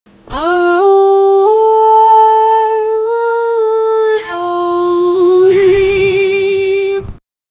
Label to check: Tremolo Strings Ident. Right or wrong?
Wrong